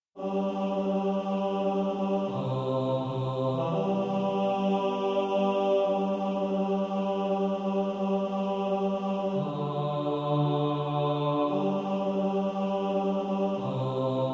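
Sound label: bass, practice music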